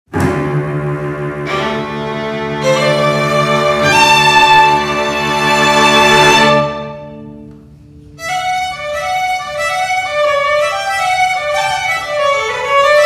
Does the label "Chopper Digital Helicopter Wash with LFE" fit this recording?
No